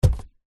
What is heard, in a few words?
footstep barefoot single wooden step